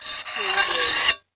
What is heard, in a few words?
Knife Scrape